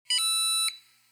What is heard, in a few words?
CHIME